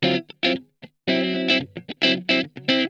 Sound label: STEAMIN, Funk Guitar; Zero G - Funk Guitar